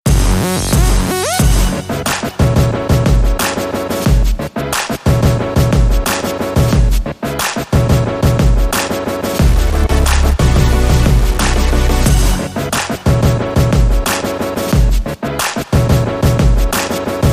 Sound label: Clap